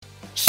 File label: tsssh